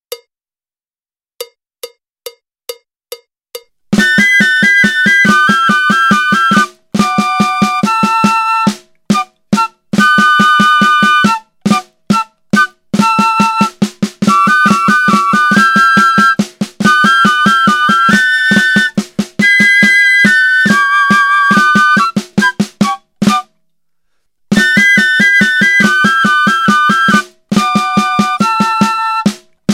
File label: flute